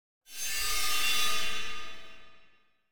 Stinger Metallic Musical Cluster Wash Short; Hollywood Edge; sound designer's toolkit v2

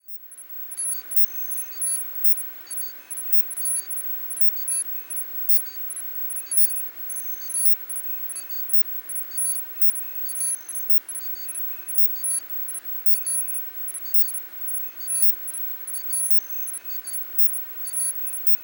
Surveillance Truck; Medical; Blastwave

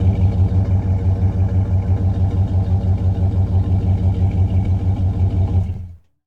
Truck Dodge Ram Idle Off; Trucks

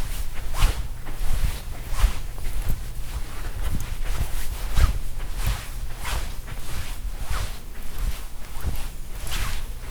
clothing rustle; blindside

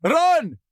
town human far; Blind Drive

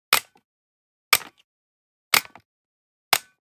Generic Button Metal Medium

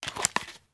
draw walky talky; firefight

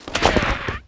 Circus Tent Deflate; SFX Kit; Foley - Misc

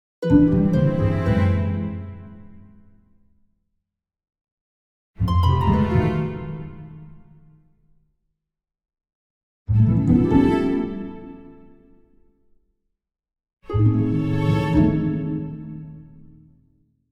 Orchestral Jingle Negative

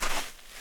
sand; firefight

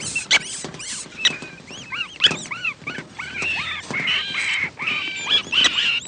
Rats; Inquisitor 2

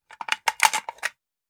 Machine Gun Foley; Sound Ideas; Guns

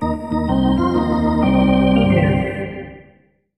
Hockey Organ

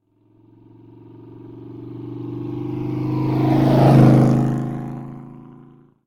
motorbike; Blind Drive

